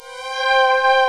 LINEAR SYNTH

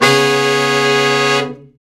BRA SECT; BRA SECTIONS

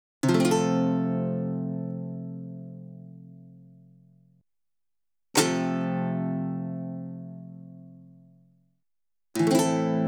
Salsa Picante; Masterbits; World Zone VOL-3

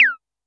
korg trident

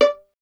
STR VLN, Solo Instruments; Miroslav Vitous

